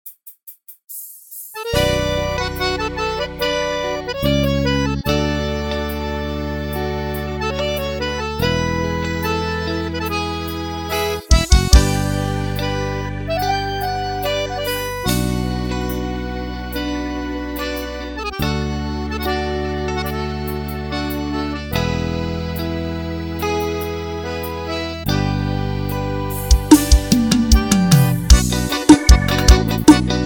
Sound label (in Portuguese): teclado forro